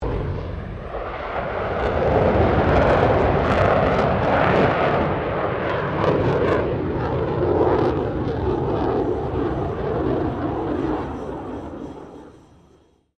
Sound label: AIRCRAFT FA-18 HORNET PASS